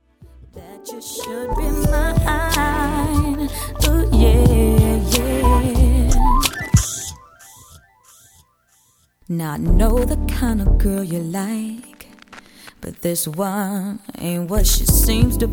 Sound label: Neo Soul Smooth Jazz